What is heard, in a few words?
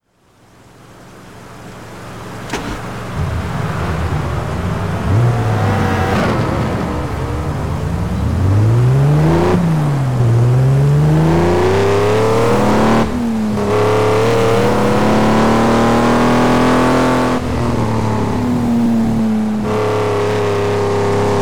subaru impreza wrx sti; TDU-1